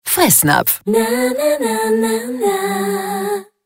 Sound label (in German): Sound Logo; Audio Branding – Akustische Markenführung – Sound Logo – Audio Logo; Fressnapf